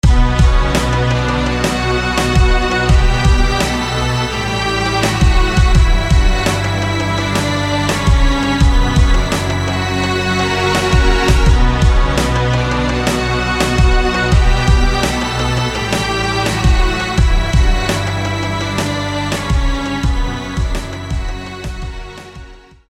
Dead walk Strings

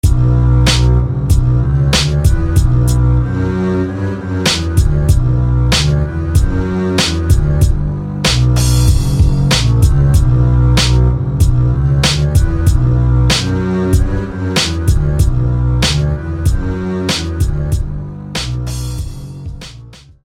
Deepened Contrabass

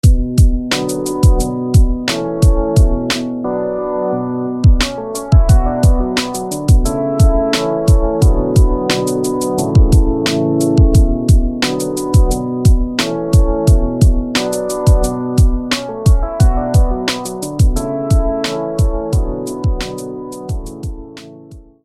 Soothing Horn; SFB FX

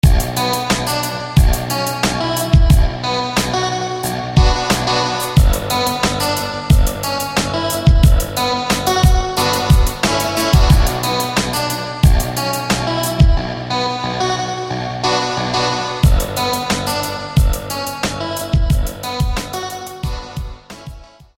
Clave; Echo Clave

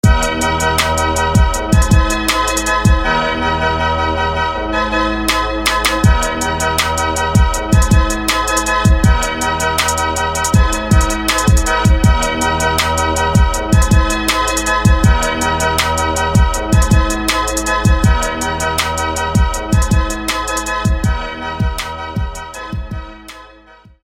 Brass; Avenging; SFB FX

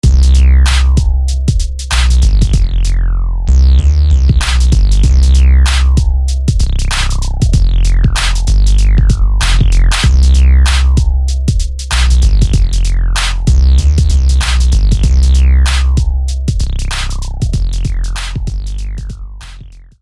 Mobbed Bass; SFB FX

